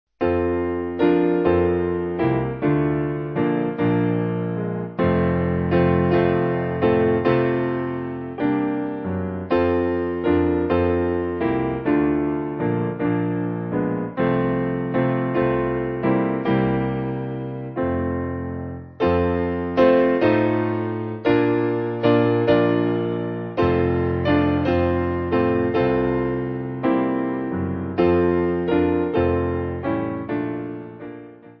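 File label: Piano, hymn backing; Simple Piano; Jesus Keep Me Near; Doane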